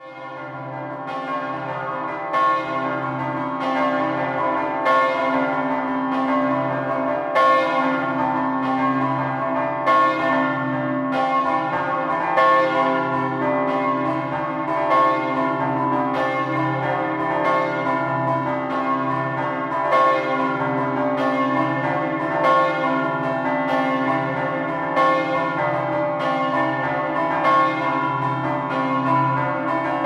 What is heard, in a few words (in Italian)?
campane; CASSACCO